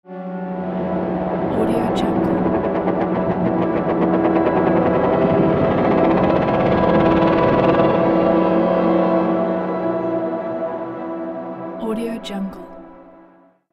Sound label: creepy riser